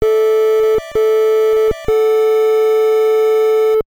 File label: Unknown Jingle; Ninja Crusaders; nes